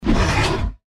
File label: deathclaw strike; npc